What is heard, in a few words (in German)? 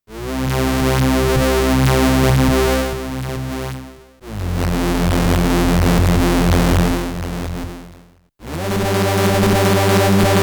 Gotharman Anamono X mini OSC Type 2 moduliert erst pur dann mit digi LPF